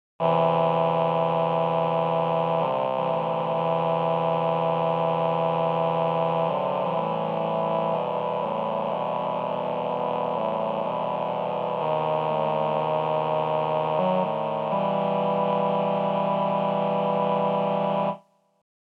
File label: Male8 Male8 Vplus